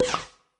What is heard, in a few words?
message received; scoponepiu